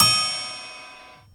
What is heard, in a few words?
normal hit whistle; osu!